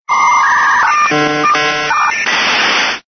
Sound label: Dial Up